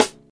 Old School Piercing Snare Drum Sound C# Key, Royality free snare single hit tuned to the C# note